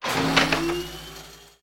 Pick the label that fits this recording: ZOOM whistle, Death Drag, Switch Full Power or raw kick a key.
Switch Full Power